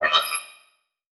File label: Title Transition Blurp; Production Element Title Transition